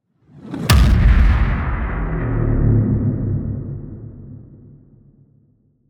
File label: Cinematic Hit; soundbits